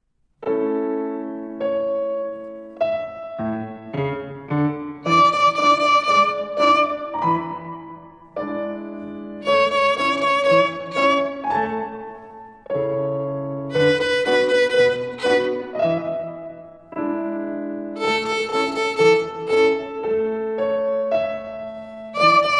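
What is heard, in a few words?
Rhythm with Three Fingers bowed, violin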